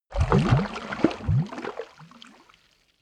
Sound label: volcano oneshot lava bubble